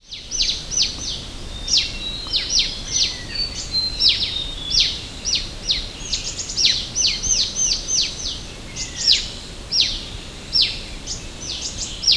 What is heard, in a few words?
Short billed Minivet Pericrocotus brevirostris